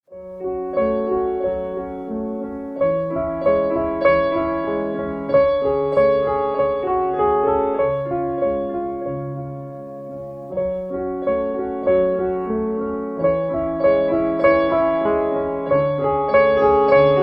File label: PIANO